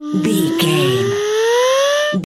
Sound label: comedy siren whistle fun up